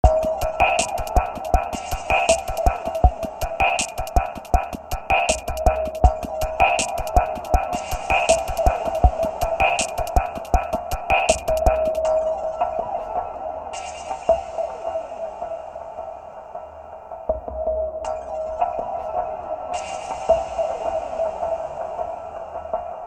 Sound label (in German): Groove enthallt